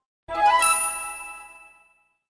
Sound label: wish fulfilled; sims 2